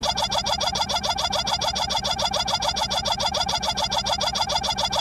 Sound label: phone; paimon